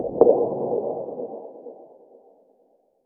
echo chamber ambience; hallway

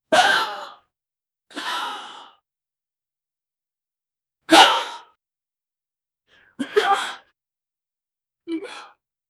engineers gasp huh or oh

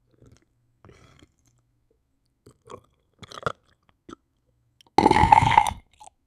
hollow slurp from an almo, liquids

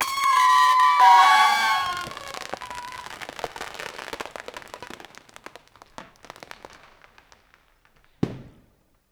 gigantic circus tent coll

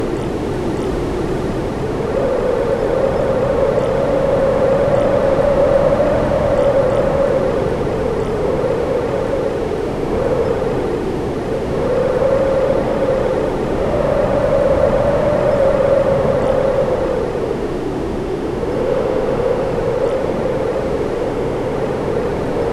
arctic howling winds snow

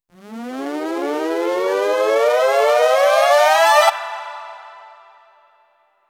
soft elegant modern synth; falling

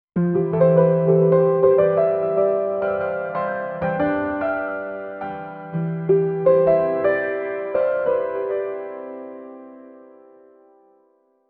warm uplifting piano chor; instrumental

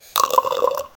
drinking potion

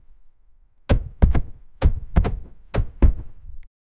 air swing from person try; impact sounds

